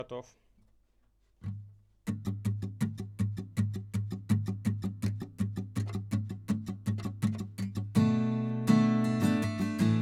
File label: GUITAR